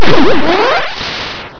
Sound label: btr attack